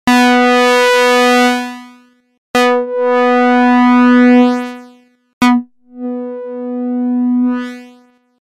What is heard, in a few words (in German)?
Env negativ